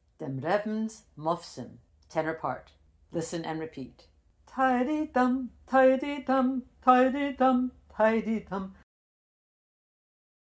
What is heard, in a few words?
tenor, chorus; Listen and repeat; Dem Rebns Mofsim